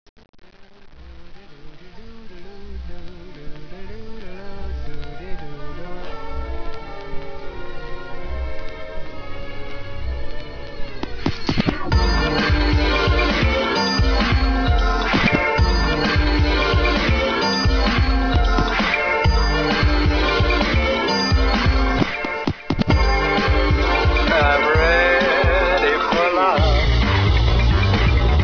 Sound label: rain remix